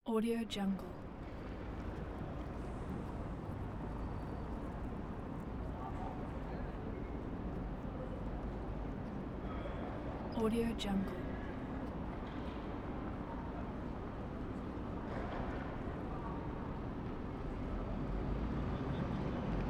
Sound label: city background